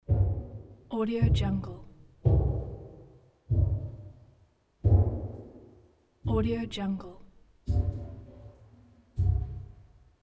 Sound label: footsteps on wooden stairs